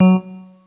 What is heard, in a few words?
Synth